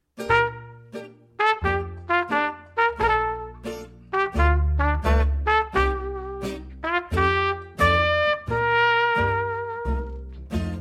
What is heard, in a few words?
Trumpet banjo upright bass